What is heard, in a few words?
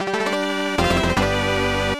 Lose